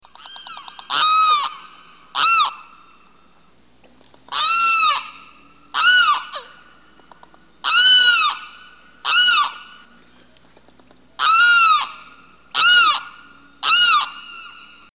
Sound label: Limpkin, songs